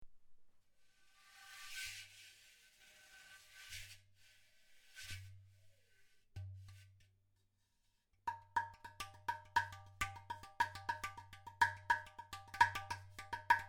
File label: udu drum